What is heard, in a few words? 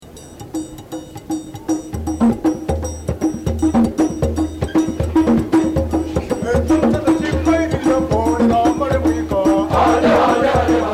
Traditional music : male solo and chorus; Kenya